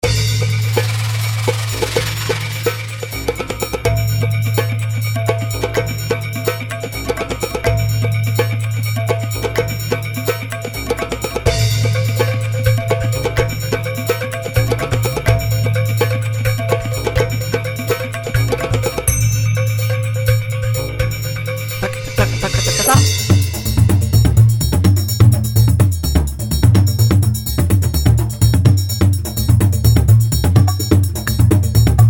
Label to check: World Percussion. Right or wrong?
Right